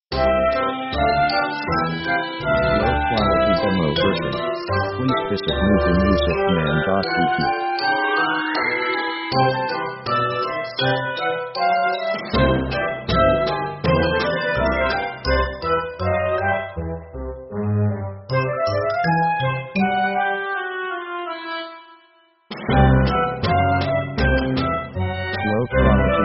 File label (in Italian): commedia, comedy